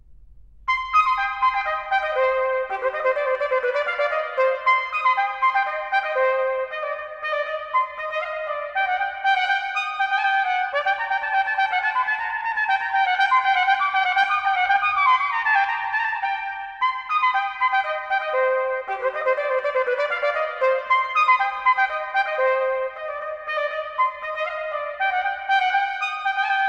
orchestral suite b minor badinerie; no ii; bwv 1067

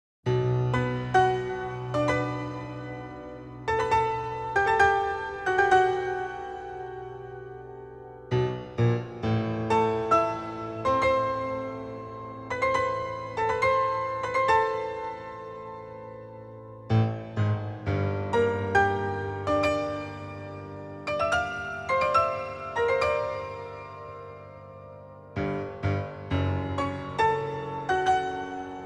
piano

